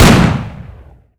winchester fire; zps; Weapons; Firearms

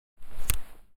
Phone Draw; zps; Weapons; Explosives

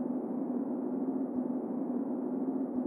Inside wind hall; zps; Ambient

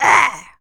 attack, Zombies; zps; Z lea

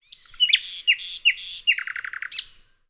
nightingale; Ambient; Birds; zps

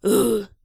pain, Zombies; zps; Vanessa

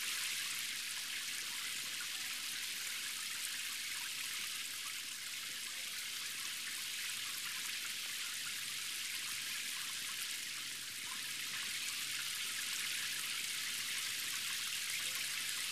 Light rain; zps; Ambient

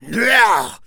Male Attack, Zombies; zps; Male1

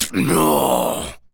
Pain, Zombies; zps; Carrier

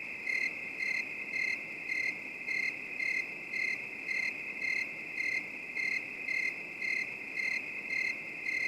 Crickets; zps; Ambient

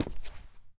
concrete, Footsteps; zps; Foley

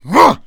Melee Grunt, Human Cop; zps; Humans; MeleeGrunts

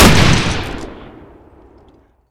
saw fire, weapons; svencoop

